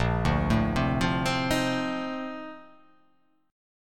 A sharp Major 11th, chord

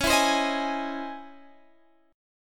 Db Minor Major 9th Db Guitar Standard; strummed; DbmM9; JGuitar

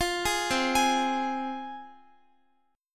C Suspended 4th Sharp 5th C, Guitar; Csus4#5 arpeggiated; x,x,15,13,12,15

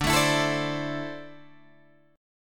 D 7th Suspended 2nd down Guitar DADGAD; D7sus2; strummed

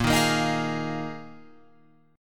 Bb 7th Suspended 4th Bb Guitar Open F; strummed; JGuitar

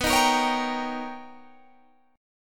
B Augmented Major 9th B, chord; B+M9; strummed; Standard; JGuitar

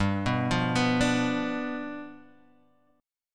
G 7th G down Guitar Open F; G7 arpeggiated; JGuitar

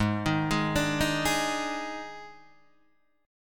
Eb Major 7th Suspended 4th

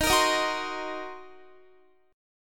D sharp Minor 6th D sharp, down, Guitar; strummed

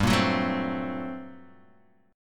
G Minor Major 11th, GmM11 chord; GmM11 strummed; 3,1,4,2,1,x; JGuitar

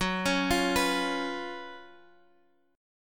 Gb Major 7th Suspended 4th, down, Guitar, Open G

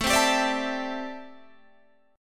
A Minor 11th A Guitar Open G

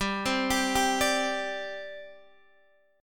G Suspended 4th, Guitar, Open D

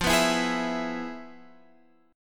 F Minor Major 7th Flat 5th Guitar Open F; FmM7b5 strummed; JGuitar

